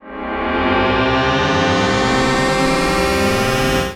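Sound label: synth, Production Effects; Best Service - XXL Production Effects; SYN-FX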